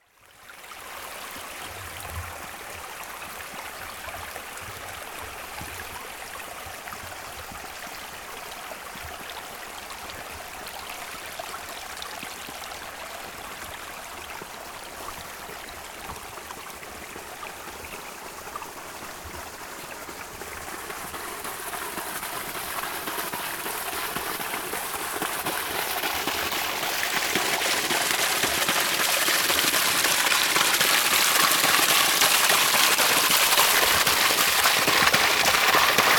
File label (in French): Ruisseau; Vlkolinec